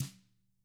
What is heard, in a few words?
TAMA; ILIO; Double Platinum Drums 1